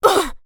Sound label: fighting game designed human voice vocalization female